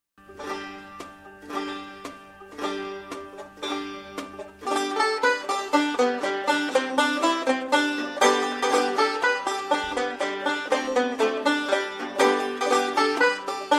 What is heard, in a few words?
banjo hollow